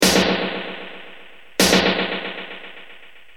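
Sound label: snare dm100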